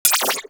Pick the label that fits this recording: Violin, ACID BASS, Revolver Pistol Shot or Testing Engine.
Revolver Pistol Shot